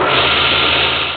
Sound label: robo; vcop